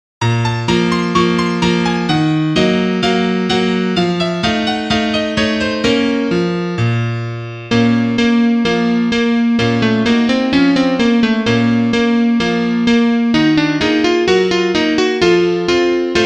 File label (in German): Klavier; posaune; kontraste; Liederbuch